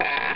Door; Sentinel

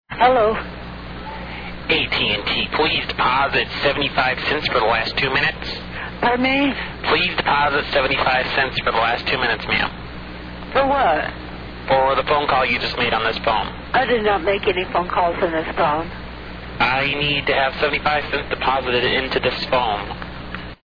deposit 75 cents

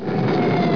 MINING; WARPATH

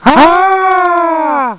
AHAH

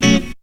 GTR SHT; BEAT2000

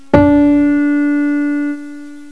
PIANO NOTE

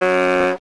WRONG

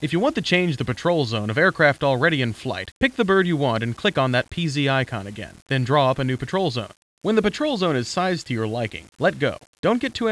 HARP

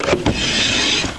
DRAW OPEN

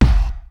IND KIK; BEAT2000

